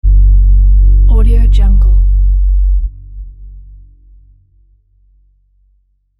button press with low sub drone

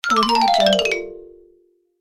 marimba fall; medusamusiclab

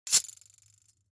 weapons knife slash vibrate

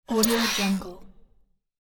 robotic sensor mech

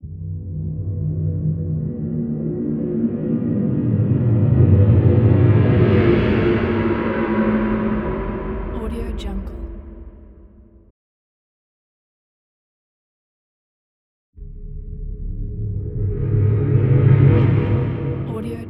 horror transition

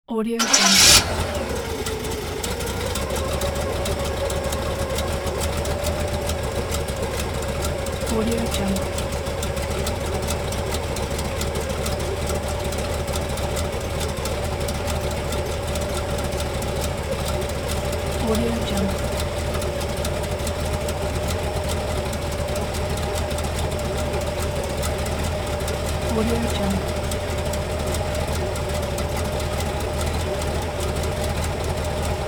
car start engine; leksab

